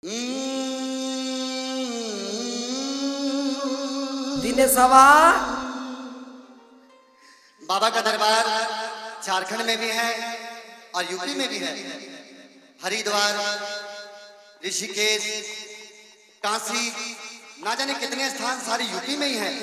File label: Jhan Jhan Bass; Kashi Jagran Song; DjAnurag Babu Jaunpurr